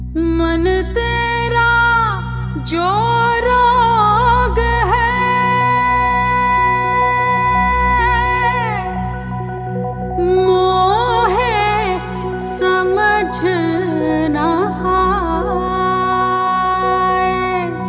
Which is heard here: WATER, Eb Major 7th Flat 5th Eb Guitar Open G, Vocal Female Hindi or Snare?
Vocal Female Hindi